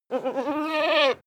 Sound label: goat baa calm; natur tiere; ziegen